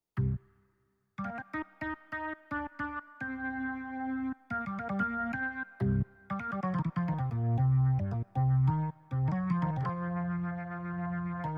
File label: Organ, Boogie Bass Line